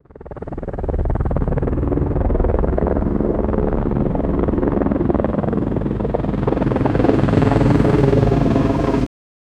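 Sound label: helicopter close perspective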